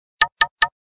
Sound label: Knock; KF600